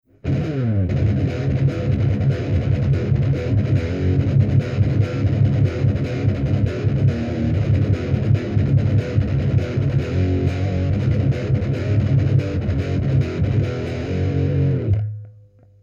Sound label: Dropped Guitar Crate Combo Amp; Shure SM57 Niere